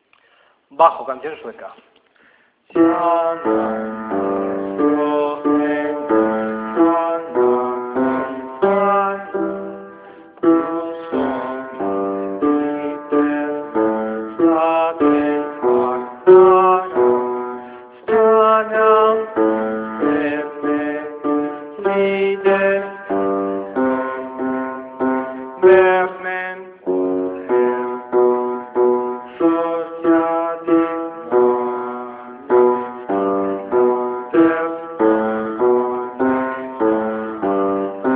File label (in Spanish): bajo